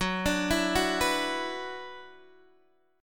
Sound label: F sharp 7th Suspended 4th Sharp 5th, Guitar, DADGAD